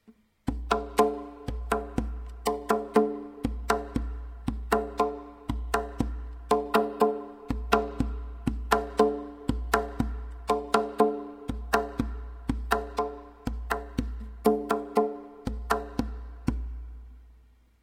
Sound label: tonbak; The right hand bak is substituted by a meyaneh; The next rhythm is in 4/4